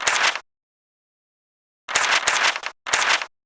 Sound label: shuffle; dgs-ryu